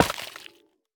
幽匿催发体破坏声

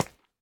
蜡烛踩踏声

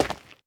玄武岩踩踏声

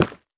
下界矿石破坏声

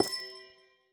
紫水晶簇放置声

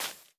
大型垂滴叶踩踏声

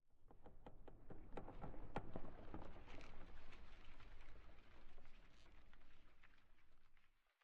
苍白垂悬苔藓声

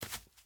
幼年海龟爬行声

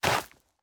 灵魂土踩踏声

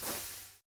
蜡烛熄灭声

